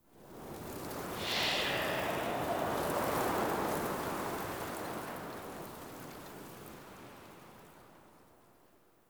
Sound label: Ext Wind Gust Calm Toxic; Ambience; Misc Outdoor; Warframe; Infested Eris